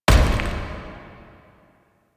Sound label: Pass Return Cave Door Stop; Ambience; Gameplay; Warframe; The War Within